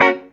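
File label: guitar chord F#, roots reggae; Sounds Good - Roots Reggae; GTR CHD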